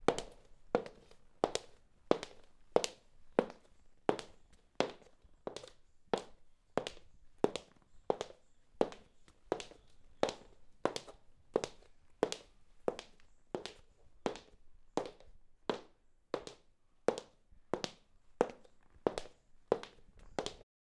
High Heels On Wooden Floor

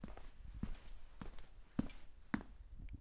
Walking Indoors On Concrete Floor

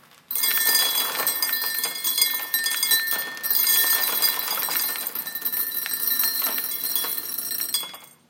Coffee Grains Fall Into A Glass

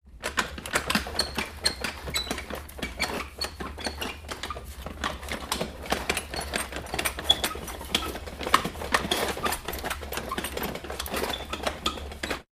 Car Squeak Chair

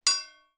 Anvil Strike Weak

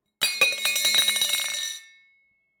Metal Cup Dropping

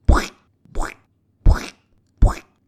Spittle Cartoon

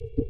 Hits

